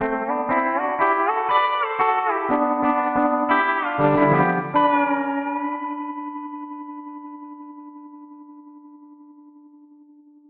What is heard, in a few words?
Tremolo; NOTION 4 Electric Guitar with Timeless 2 Tremolo; FabFilter; ReWire2; External MIDI